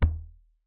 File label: collect; grunk; tools